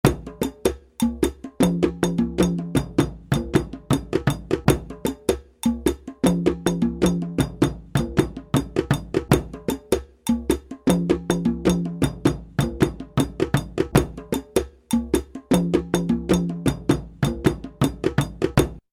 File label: Doundoumba ensemble